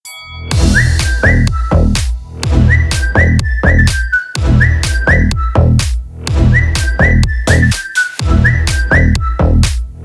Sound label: whistle